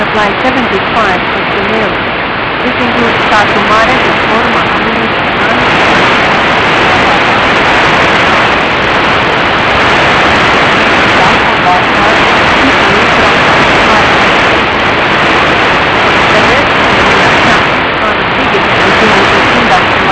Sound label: off air